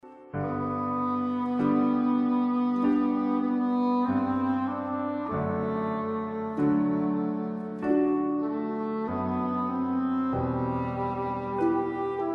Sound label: Arioso; CLASSICAL RELAXATION